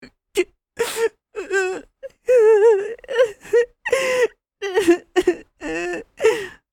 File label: Crying anime boy vocalization